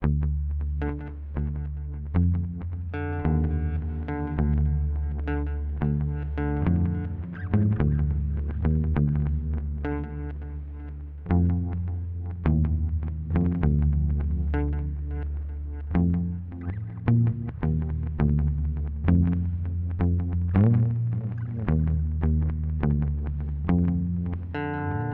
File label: Amplitube X-Time Rev Pong